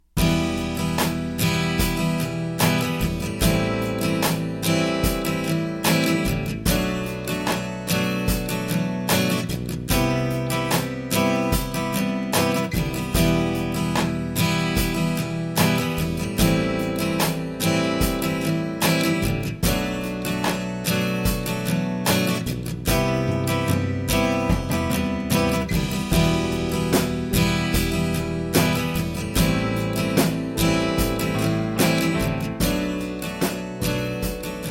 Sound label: Em Am Bm Cmaj7; Raven Tracks